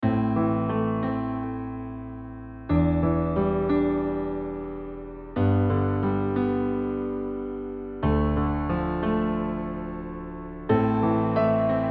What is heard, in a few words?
menu background